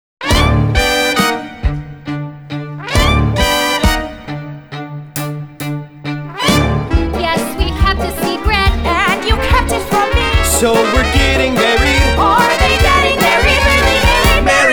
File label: guide vocal